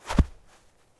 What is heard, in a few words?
footsteps snow